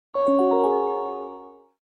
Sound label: chime, ringtones; star tours; Disney